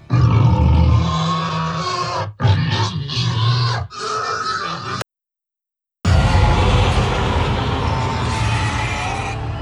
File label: Squeal; HOTS